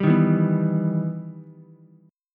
E Minor Major 7th, Ukulele; EmM7 strummed

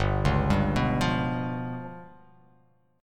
G 7th Flat 5th

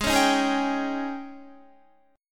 Ab Major 7th Suspended 4th Ab down Guitar; AbM7sus4 strummed

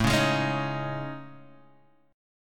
A Major Sharp 11th, Guitar, Half Step Down

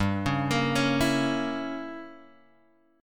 G-Diminished 7th-G-down-Guitar-Open F; arpeggiated; JGuitar

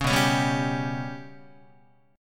B Suspended 2nd Sharp 5th down Guitar; Bsus2#5 strummed; JGuitar

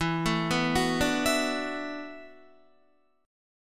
E 9th Suspended 4th E down Guitar; E9sus4 arpeggiated; JGuitar

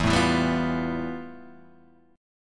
F Major Sharp 11th E

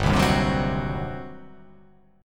G Major 7th Suspended 4th Sharp 5th, G, down

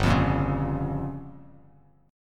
G Minor 7th Flat 5th, Bass Standard; Gm7b5 strummed; JGuitar

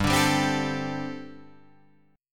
G Suspended 2nd Suspended 4th G, Guitar, Open G

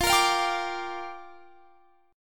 Bb 6th F

